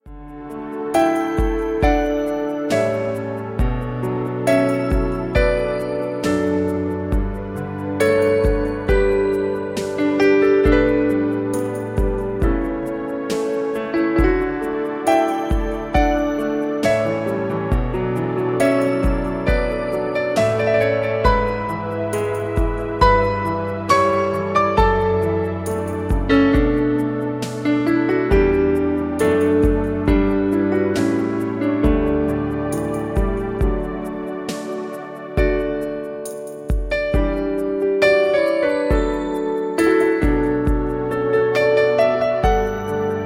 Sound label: Instrumental